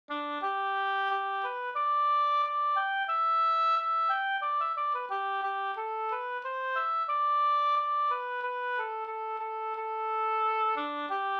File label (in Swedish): brudmarsch; fr Ronnofors